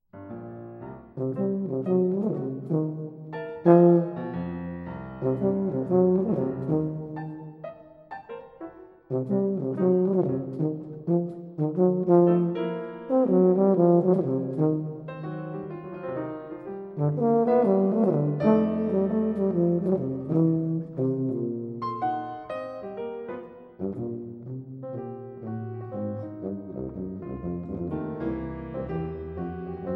Retratos for Tuba and Piano - Mvt. 4. Blues

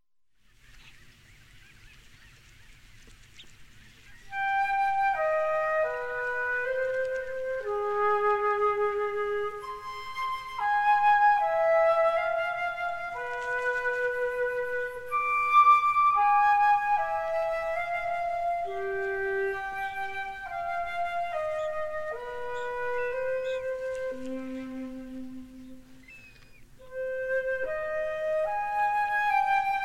Suite in C Minor, Sarabande; Suite No. 5; BWV 1011

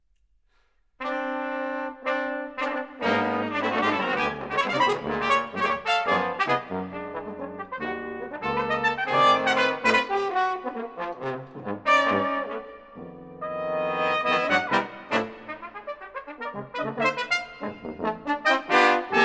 Brass Quintet

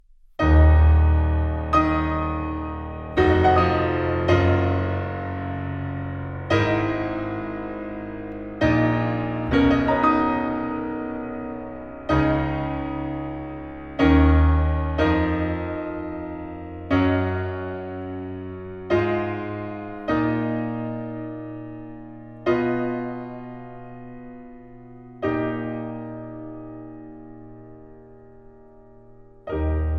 Piano Sonata Adagio Poco allegro; Martinů

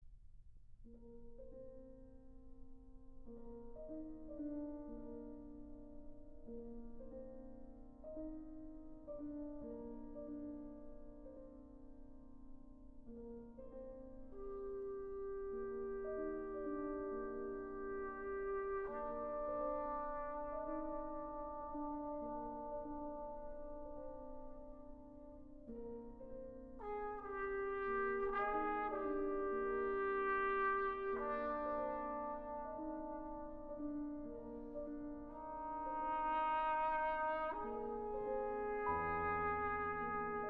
Night Set for Trombone and Piano

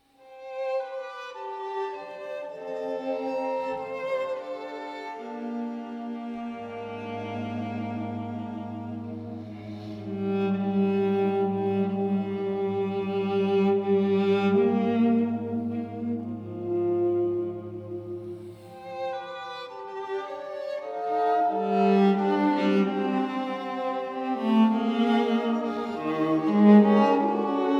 String Quartet Andante Pensive

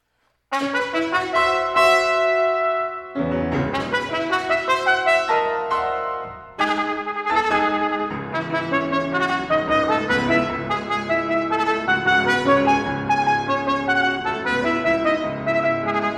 Sonata for Trumpet and Piano, Allegro con Fuoco; Music for the Soloists of the ABQ

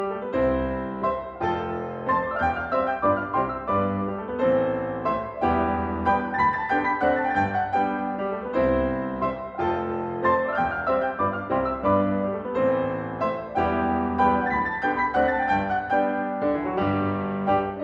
Waltzes, For Piano, four Hands, C Major; Brahms

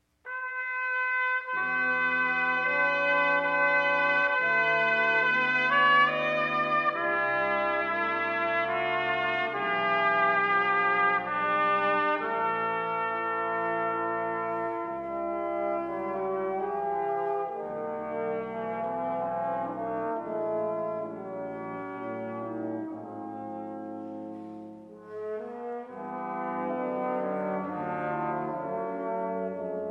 Suite For Brass Quintet, Arioso

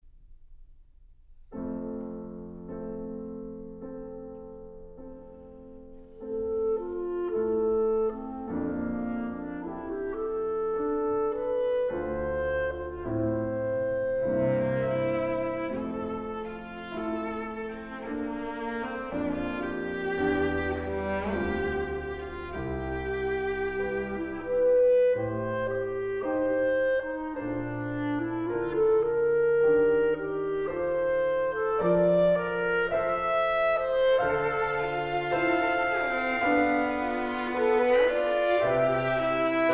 Piano Trio, Andantino

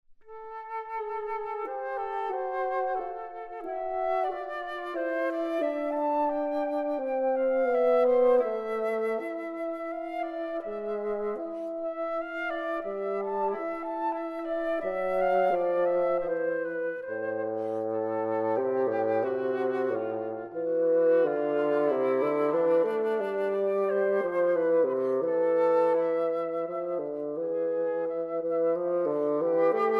Petite Suite For Flute & Bassoon, Prelude

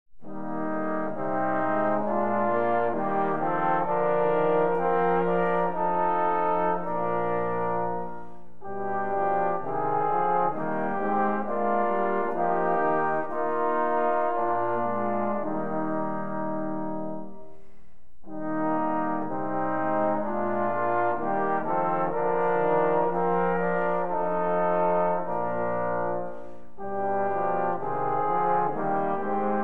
Trombones; Break Forth, O Beautious Heavenly Light

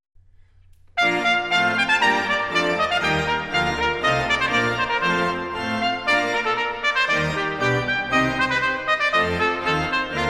Concerto in B-flat, Trumpet

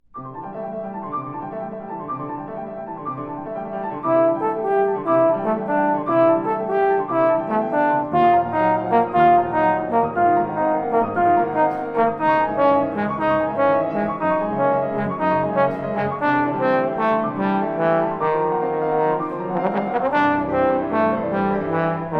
Sonata for Trombone and Piano, Allegro scherzando

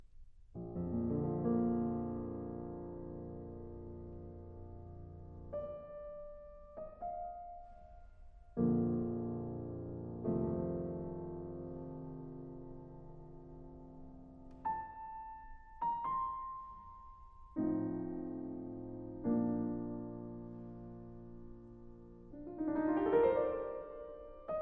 Piano Sonata in D Minor, Adagio; Beethoven Works For Piano

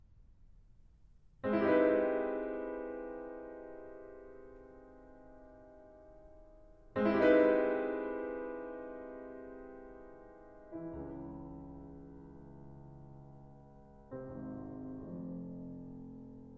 Sonata for Trombone & Piano; American Voices Vol. II; Mvt. 1. Solloquies (Desperateky, In Vain)

